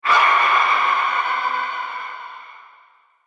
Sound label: Screams Ghosts; Ghost Sound Effects; horror sound effects